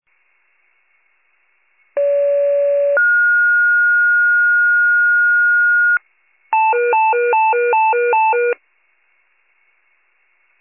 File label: fire, pager alert